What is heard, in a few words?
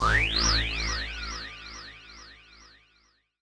KEY SHOT TEKNO 140 A; Techno2; Singleshots